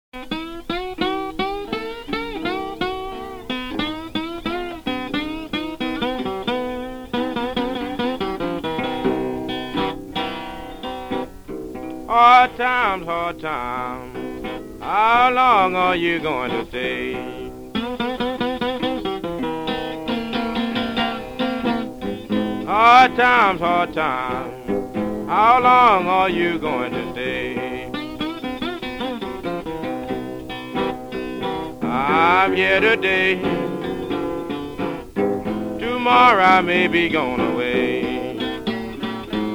Slide Guitar; Jazz & Rhythm & Blues

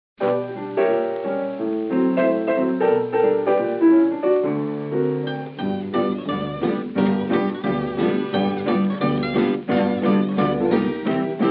Slide Guitar, Blues

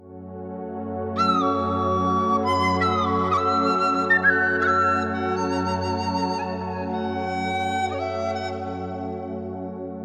Relaxing Flows, Nature Sounds With Music

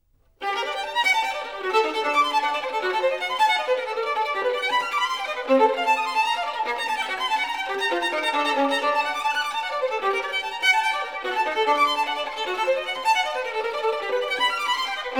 Caprice for Solo Violin, op. 1 no. 16 in G minor; Accardo; Paganini

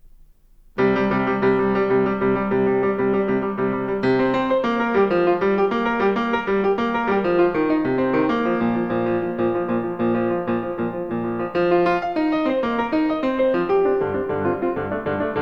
Mikrokosmos Dance in Bulgarian Rhythm II, Piano; Bartók; Sz. 107 Book 6 No.148

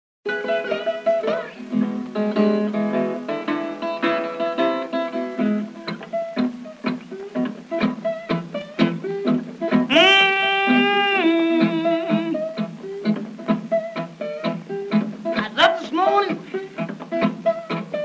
Slide Guitar; Jazz & Rhythm & Blues

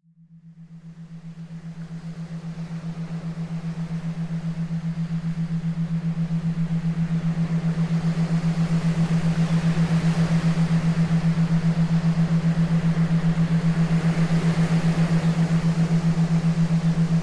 Binaural Beats Theta Meditation Beat Waves; Nature Sounds

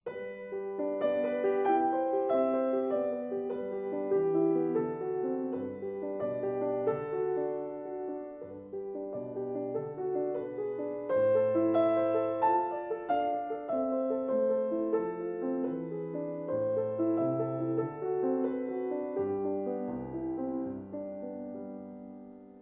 for piano, Lullaby; Albumblätter (Album Leaves); Op. 124; Wiegenliedchen; Sensual Classics II

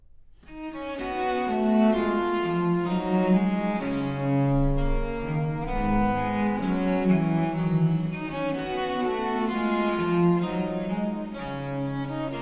harpsichord solo, Canon simplex; Bach; Musikalisches Opfer BWV 1079

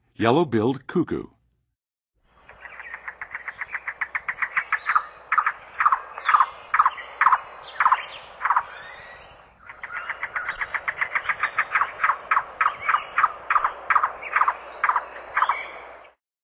Yellow-billed Cuckoo; N. America; Songs of Birds of North America; Western USA Songbirds

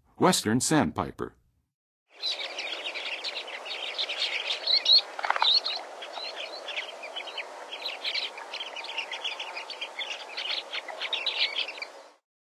Western Sandpiper; N. America; Songs of Birds of North America; Western USA Songbirds

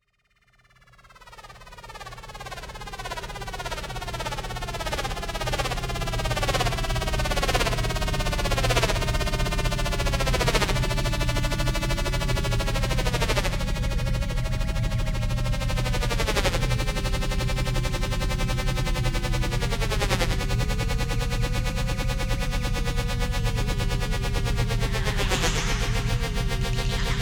Flying Saucer Landing, Scary Sound Effects

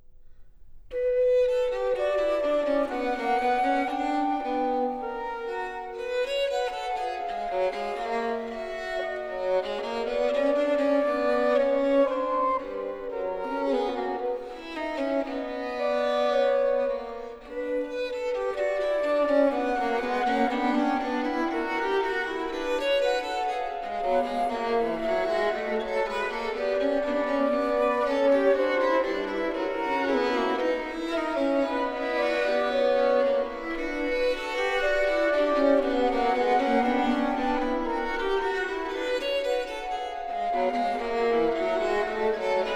Canon 3 a 2 per Motum contrarium (flute, violin; flute, violin, viola; Bach - Musikalisches Opfer BWV 1079; Canones diversi super Thema Regium

